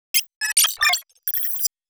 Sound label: Pixel Crush Pop, glitch